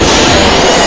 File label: jumpscare sound; one night at scars ngme